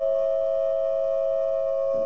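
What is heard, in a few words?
C sharp 5 with E5, flute multiphonics; modern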